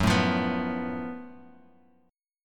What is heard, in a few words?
F sharp Minor Major 7th Double Flat 5th, Guitar Open F; strummed; 6,4,5,6,x,x; down